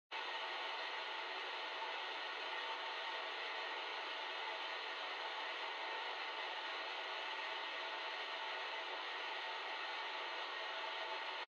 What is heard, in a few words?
Radio Signal Noise Far AM